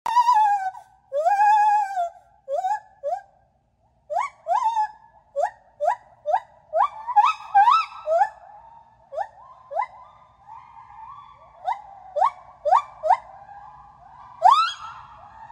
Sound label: Gibbon being Chatty; Nicholas the Gibbon